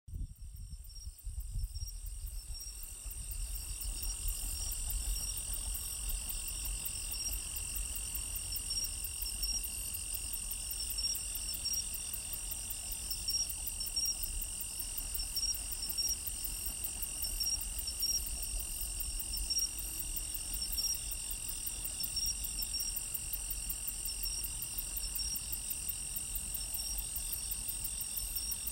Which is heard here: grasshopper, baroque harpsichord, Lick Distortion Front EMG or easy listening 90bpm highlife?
grasshopper